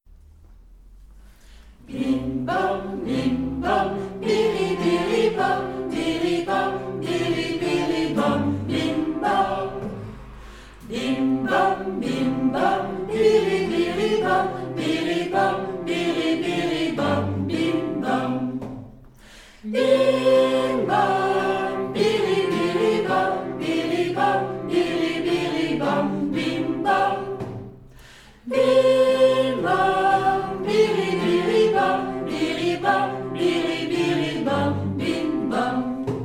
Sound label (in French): chanson chantee puis chantee frappee